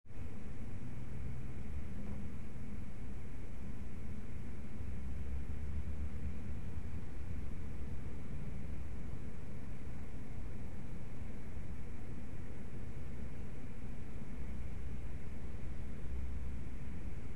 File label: Room Light Traffic, traffic, distant